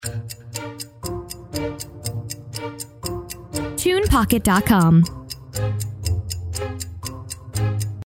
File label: Cartoon Timer Loop; TunePocket